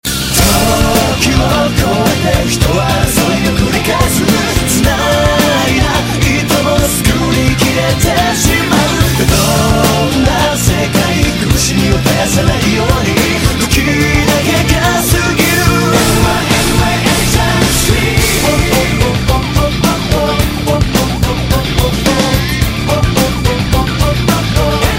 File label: Scream